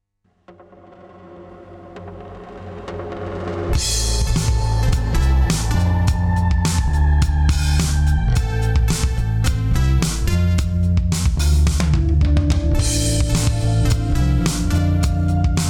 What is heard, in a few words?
80s stereo